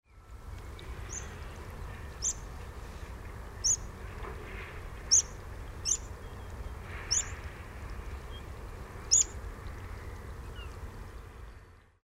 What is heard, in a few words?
water pipit